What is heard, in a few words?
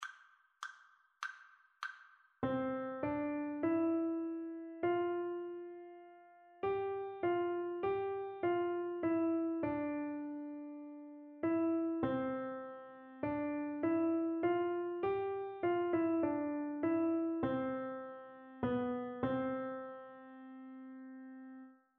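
rhythmic dictation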